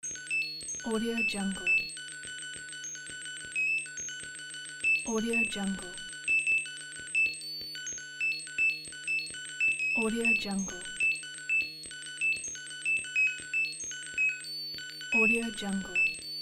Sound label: cibernetic hitech